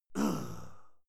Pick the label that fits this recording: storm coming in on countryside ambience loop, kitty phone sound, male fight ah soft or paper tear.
male fight ah soft